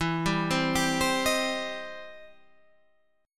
E Minor Major 7th Sharp 5th, E, down, Guitar, Standard; EmM7#5 arpeggiated